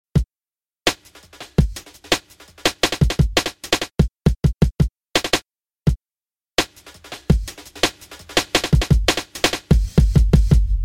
breakbeat envelopes

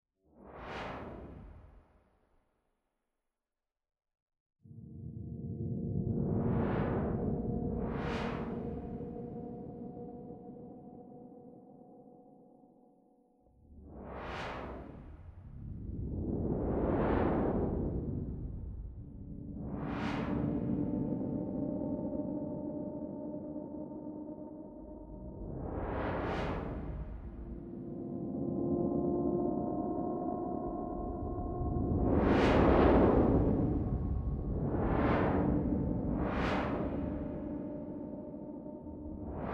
noise; earlabs

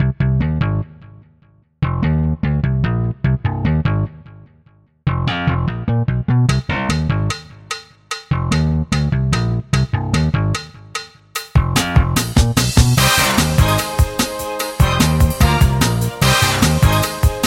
swing; arok08

